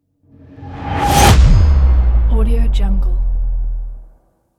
epic trailer bass impact; phillipmariani

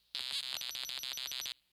burst of beeps buzzy squiggle; prosoundfx

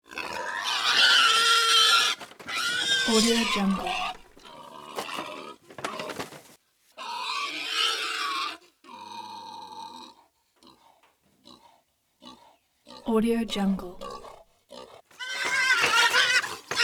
wild boar tormented squeal screams grunts

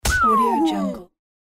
get hit lose points

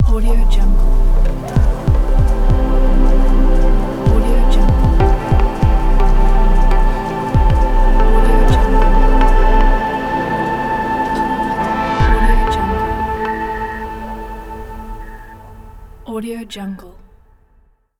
modern logo

